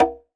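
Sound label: R-8 conga slap; EdgeSounds; Drum Mashines VOL-1; R-8 DRUMS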